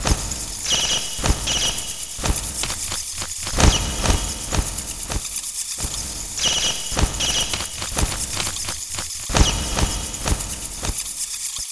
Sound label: Bat